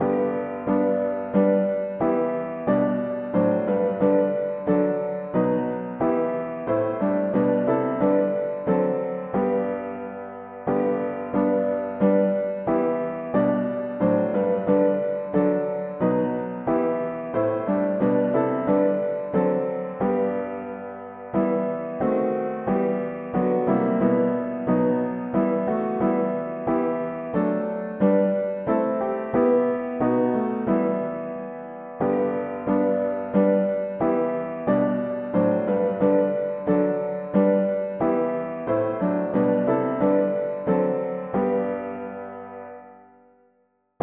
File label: mono piano; hymns; Gathered to Thy Name Lord Jesus